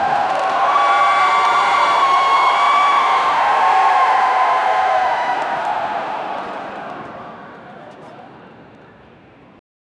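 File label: loud stadium crowd cheering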